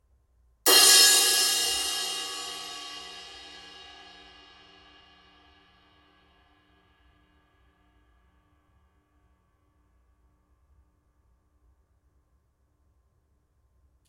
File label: 16in Heavy Crash, cymbal sound